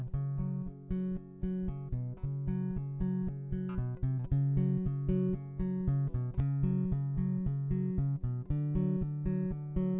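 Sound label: with drums, instantaneous; SiSEC11; underdetermined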